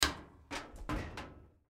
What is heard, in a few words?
Dryer Door Open; Appliance; Household; Blastwave